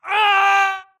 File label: grunt death crush; Titanfall 2